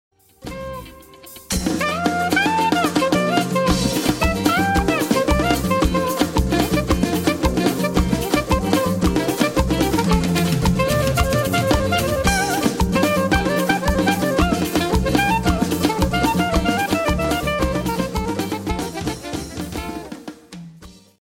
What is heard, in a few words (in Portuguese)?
Carimbó No Sax Soprano